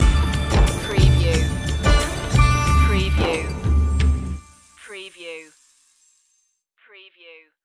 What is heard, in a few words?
snake charmer stinger